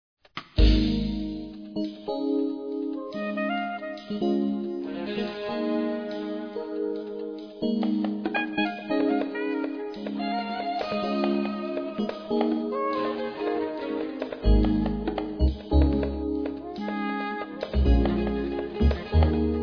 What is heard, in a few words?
Upbeat